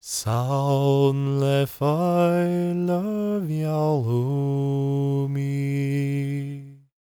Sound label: GAELIC, World Voices; ILIO; Vocal Planet